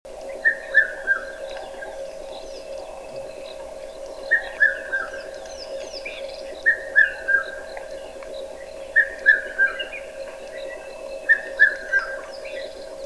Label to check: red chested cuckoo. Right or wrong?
Right